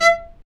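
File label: STRINGS, Low Marcato; Roland L-CD702; Combos 1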